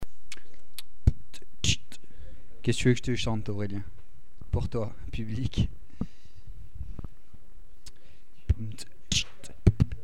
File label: beatbox freestyle session